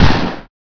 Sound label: pistol shot